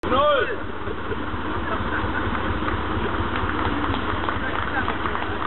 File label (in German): Band durchschneiden; OB Herr Griesert